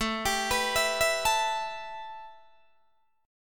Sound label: A 7th Suspended 2nd A Guitar Open D, chord